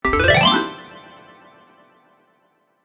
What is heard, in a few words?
kirarin